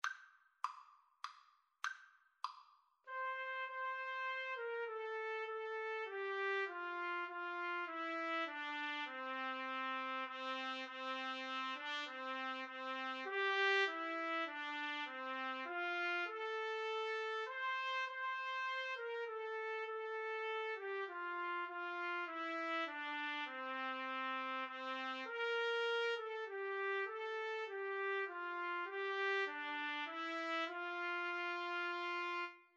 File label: Trumpet Duet